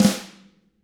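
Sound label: TAMA snare, flam; ILIO; Double Platinum Drums 1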